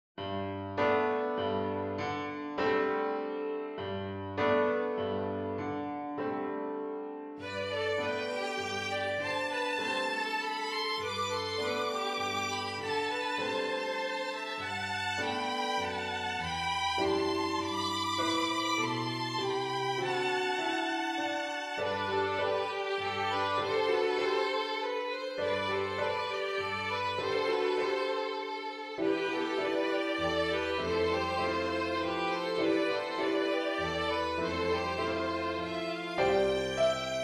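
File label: two violins; double violin; double violin series set 1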